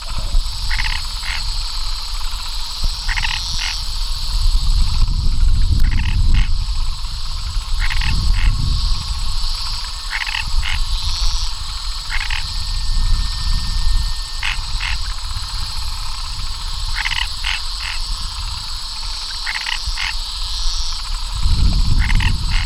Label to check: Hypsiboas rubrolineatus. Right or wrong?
Right